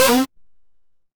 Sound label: retro game jump